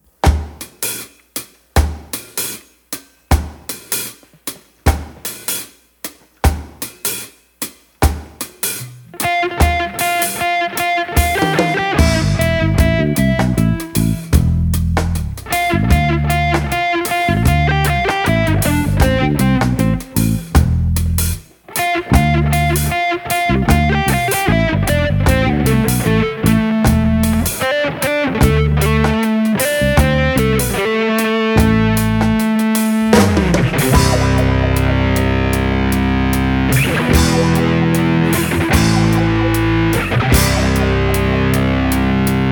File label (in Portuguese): Reggae maneiro pagode